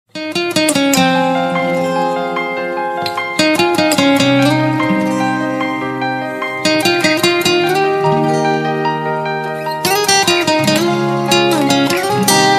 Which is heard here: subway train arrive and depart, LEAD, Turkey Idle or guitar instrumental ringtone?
guitar instrumental ringtone